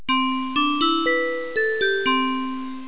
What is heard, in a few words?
XYLO; Education Publishing Concepts; NOAHDEMO